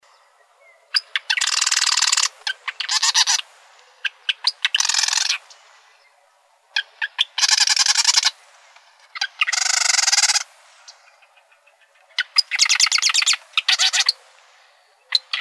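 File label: Marsh Wren; MAWR; deviche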